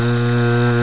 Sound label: bee